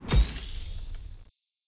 ui spelldraw correct

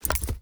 ui submenu open

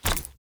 ui submenu open; Shelter

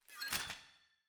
ambience room witch lodge spell ready

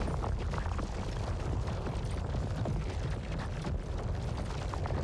Invisible Platform Move; TANK; 3Souls

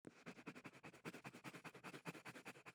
ambience room shadow room working layer

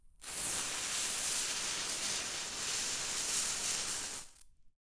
ambience room forge working layer

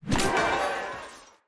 ui riot begin; Shelter

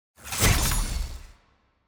Orbital Spikes Throw; TANK; 3Souls

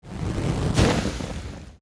npc fire golem strike

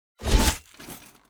crusader strike sword

